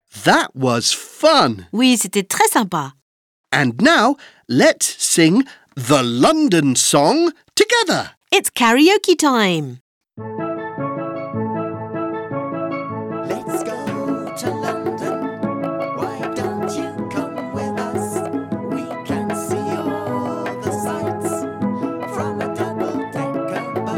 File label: Let's sing The London song karaoke